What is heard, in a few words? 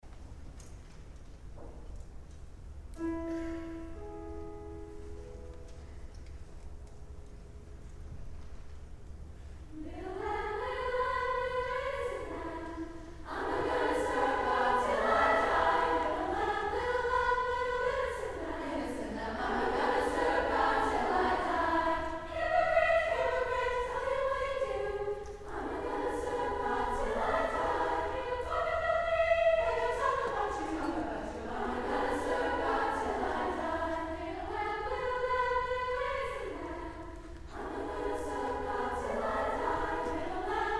Vocal School; Little Lamb